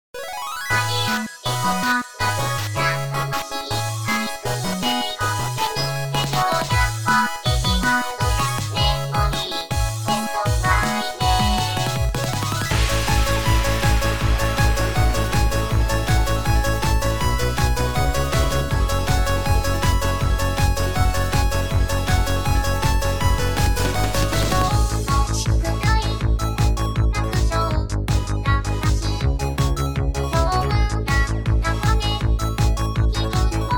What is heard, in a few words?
bpm160; pvcontest; hischooldays